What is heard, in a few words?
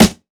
nice snare drum g sharp key; snare drum one shots